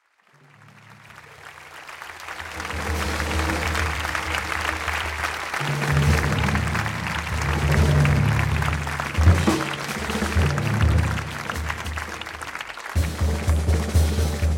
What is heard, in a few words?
Blues Minor